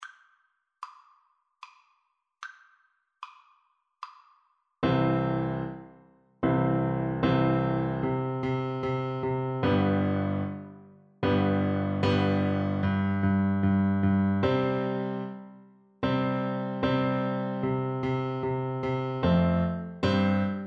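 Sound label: fanfare karaoke, trumpet; corrette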